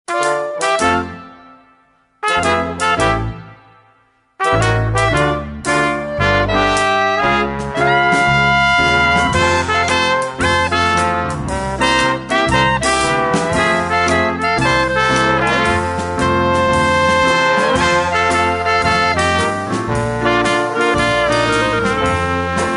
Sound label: blues